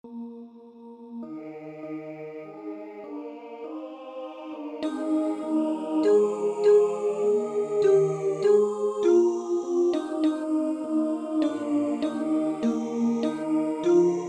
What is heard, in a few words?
O Quam Kyrie Alto